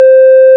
ORG Farfisa Flts; Keyboards of The 60's and 70's

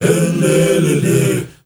MALE HUM; Spectrasonics; Heart of Africa

